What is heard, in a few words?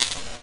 STONES, Anime Sound FX; AKAI; FX SIGNALS